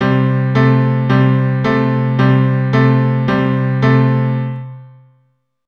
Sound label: Blues Bass C, keyboard